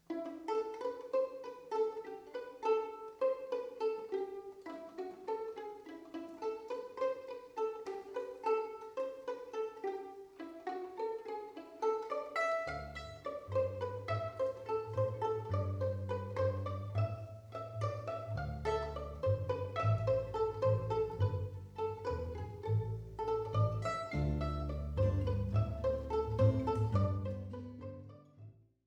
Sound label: Valse